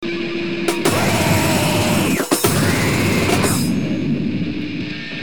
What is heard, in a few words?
NOISECORE